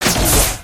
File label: Rocket Launch